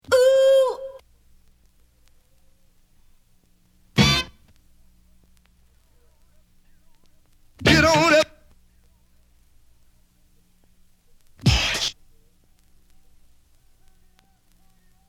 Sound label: samples scratches; wicked mix vol 002